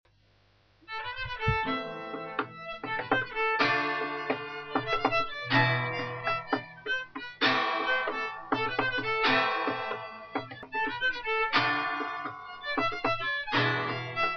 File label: tango